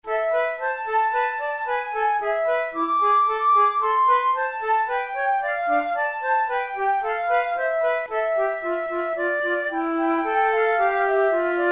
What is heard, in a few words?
pour la flute duos allegretto